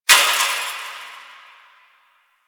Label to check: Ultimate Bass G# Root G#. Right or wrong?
Wrong